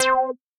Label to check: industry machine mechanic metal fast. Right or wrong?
Wrong